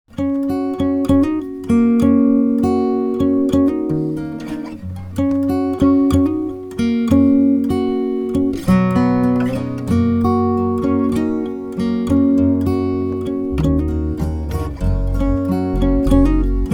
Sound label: Donkey Cart mix, guitar